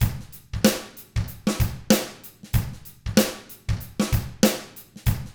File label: 95 FUNK, Drums; ILIO; Double Platinum Drums 1